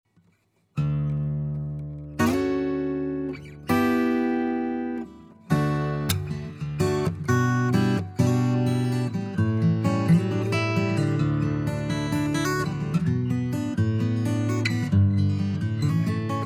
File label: Guitar